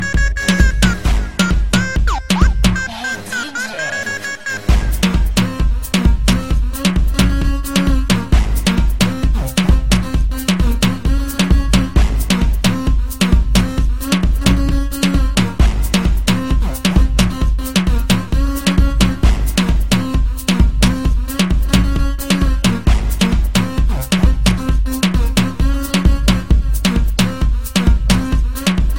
Latin Electronica

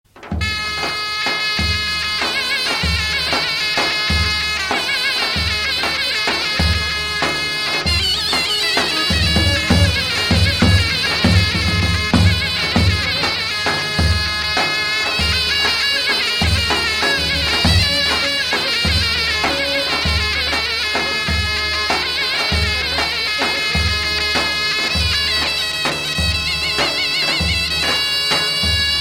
Davul Zurna

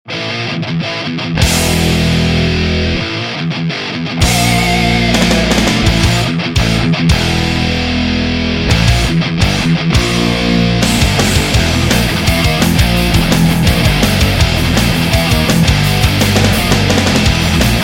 Thrash

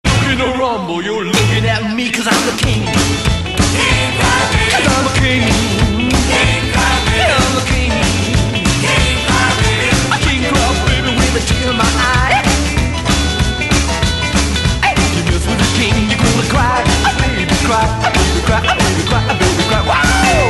Cry Baby

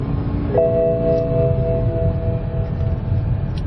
Bmw M5 Chime

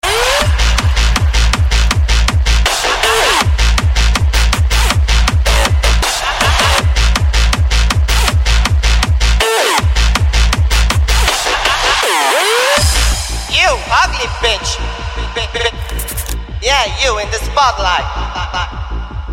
Hardstyle

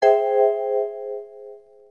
Chat Notification

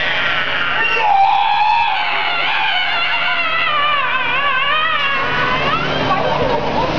Battle Cry

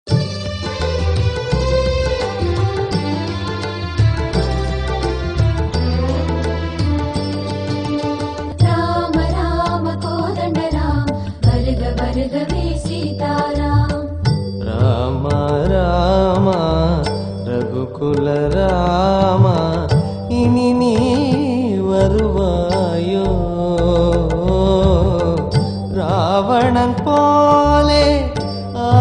Hindu Devotional

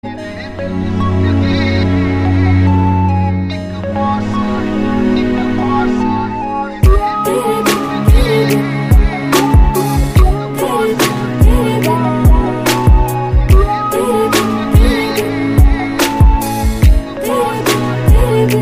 Punjabi

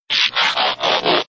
Transform

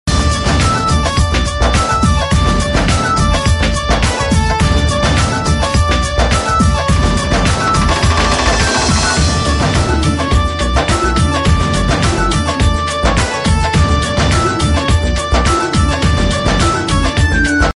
Music

